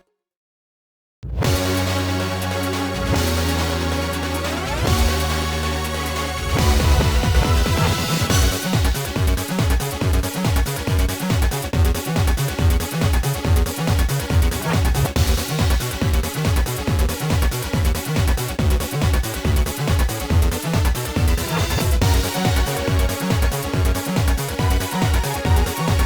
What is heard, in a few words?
BGM Music - Tournaments; YuGiOh Over the Nexus